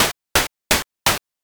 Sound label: snow step